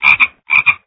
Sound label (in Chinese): 蛙; LockChime